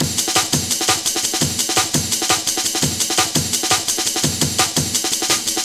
Kickin Break, Drum & Bass, Beats & Breaks

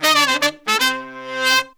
Pop & Funk Brass, 120

